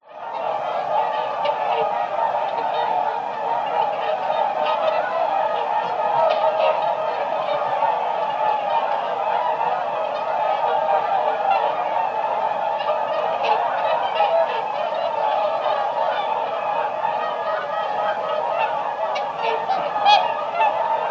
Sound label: Bird Whooper Swan; audio hero